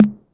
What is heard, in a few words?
tr606 tom